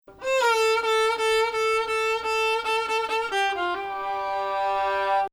Bayati Husseini Nahawand; Husseini 5 (Nah4)

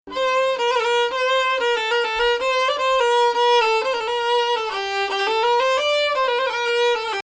Bayati Rast, Maqam Bayati

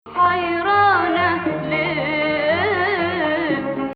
Rast Nahawand

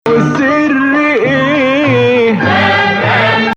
Nawa Bayati; possible to parse the last part of phrase as a part of Rast

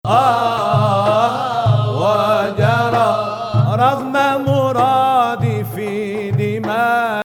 Ajam Octave; Ajam, which resembles the Western Major scale, has two distinct versions

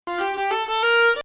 Bayati Ajam 6, Maqam Bayati; 2nd Ajam 3/6; again, an alternate parse--melody contained within #21

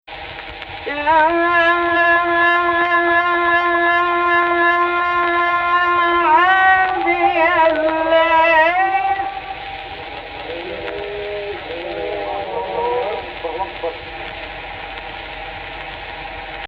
AHH, Egypt Rast

